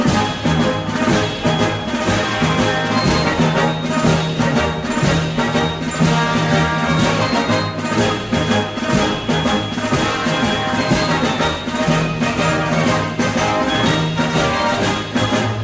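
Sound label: Win, game music; Project Reality BF2 1.6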